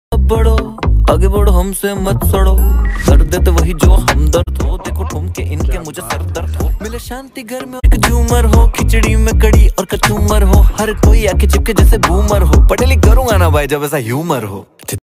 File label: Ringtone